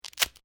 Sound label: DRY BRITTLE SNAPPING; Various Organic Foley sounds